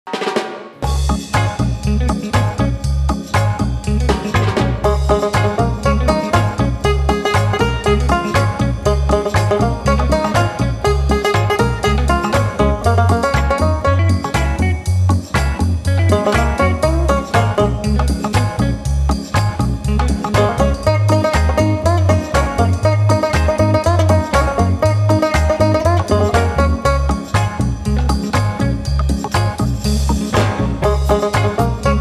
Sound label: surfin banjo; musikbett der ms sonnenkönigin radiowerbung; Radio Vorarlberg